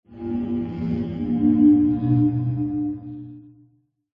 science fiction mysterious swell

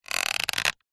plastic creak stress; zapsplat; foley

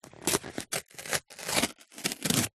cardboard box small glossy skin rip; foley

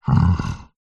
horror yeti monster grunt calm loving; zapsplat

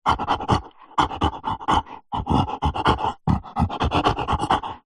horror yeti monster grunt breathy playful; zapsplat

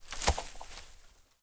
impact tree branch small land on forest floor

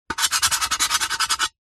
foley metal aerosol can scratch sharp object

